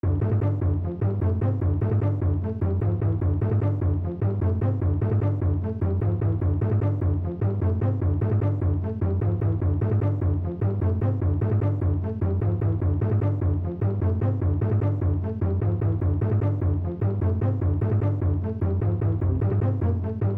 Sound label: Jungle Rhythm; Pitfall The Mayan Adventure; SNES